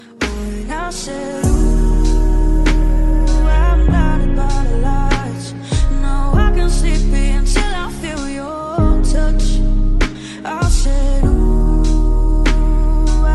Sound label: English Song Ringtone